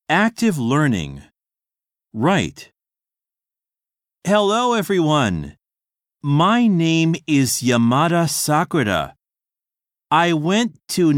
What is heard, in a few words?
harmony; 新課程英語教科書 指導用音声CD